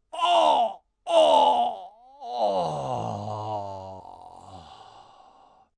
Pain Relief, Pain, male, subsiding pain, almost relief, agony, moan; sex, orgasm (human voice); Sex (Sound Effects:Human); VoiceBox